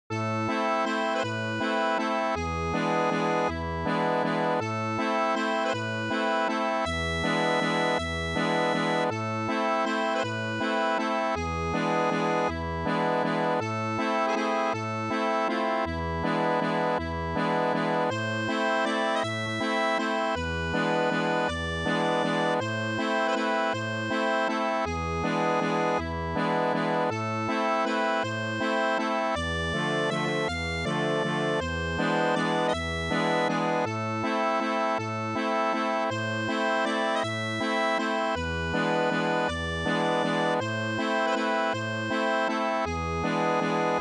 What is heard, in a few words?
Accordion